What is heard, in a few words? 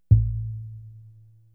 808s